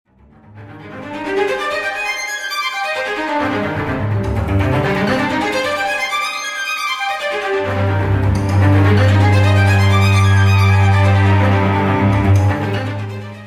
cis minor all i phone x ringtone

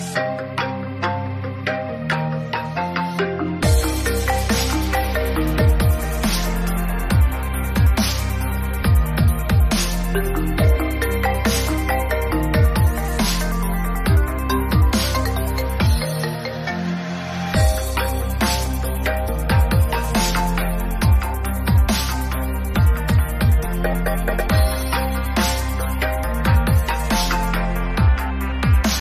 iphone 6 remix ringtone

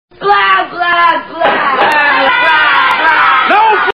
oppo smart phone ringtone

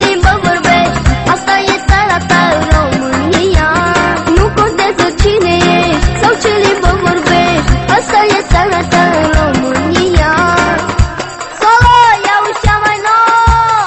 romanian boy nice song ringtone

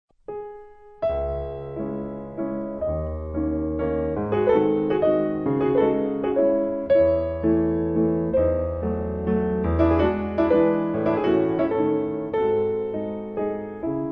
walzer iphone 3g s remix ringtone; chopin

